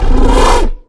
giant idle; mutants; STALKER; customnpcs

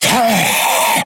snork take hit, mutants; STALKER; customnpcs

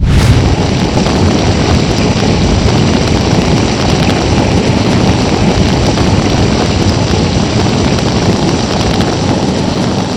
fry active, anomaly; STALKER; somber anomaly

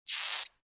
rat agroed; STALKER; customnpcs

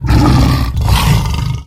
mgiant aggressive; STALKER; customnpcs

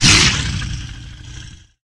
take hit, mutants; dog; STALKER; customnpcs

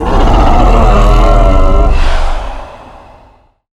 giant death; mutants; STALKER; customnpcs

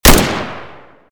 f2000 fire; STALKER

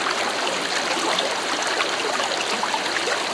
river; STALKER

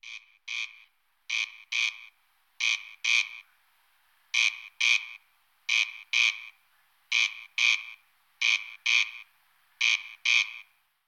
ambient cricket; STALKER

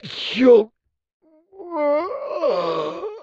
dolg death; STALKER; customnpcs

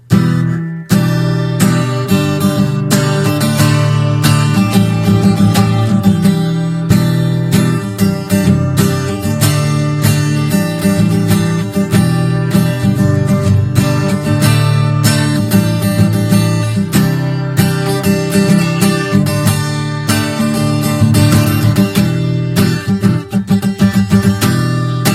guitar; STALKER

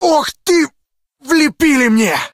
stalkers hit; customnpcs

